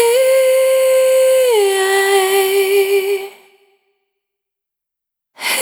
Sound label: Vapor, Single Voice Melodic Loops